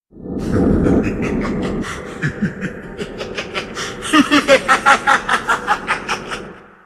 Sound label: laugh; xerath; gmod source